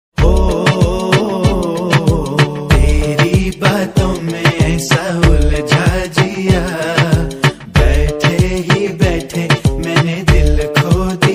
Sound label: love ringtones hindi romantic